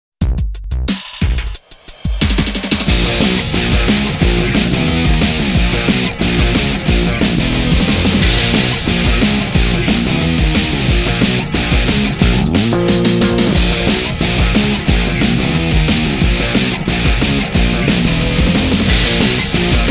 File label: JINGLE; Shadow The Hedgehog